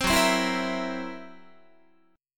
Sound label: Gb 7th Flat 5th Gb Guitar; Listen to Gb7b5 strummed